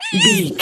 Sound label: voice fun ant creature